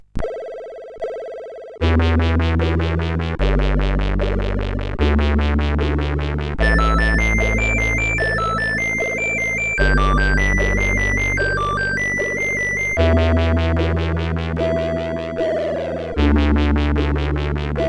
New Jump note, CSG8580R5

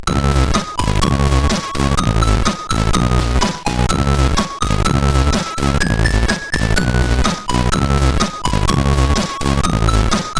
Mamba Tune plus Digi, CSG8580R5

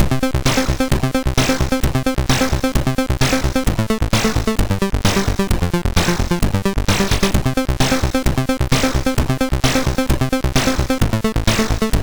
Dance Sample, MOS6581R2

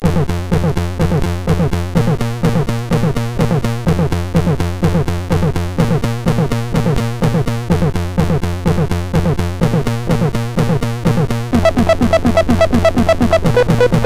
Heart Drums, sid MOS6581R4

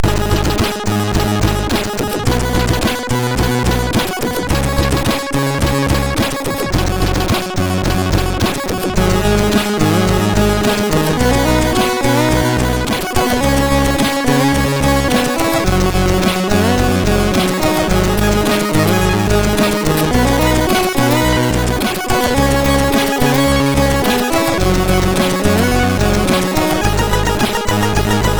Simple Music, MOS6581R3; SoedeSoft

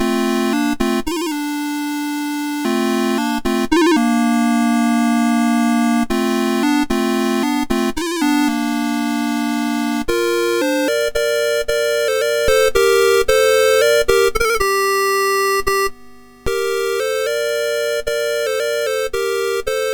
Arab Dance, MOS6581R2

